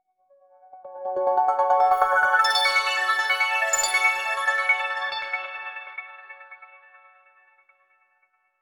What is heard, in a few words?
a modern operating system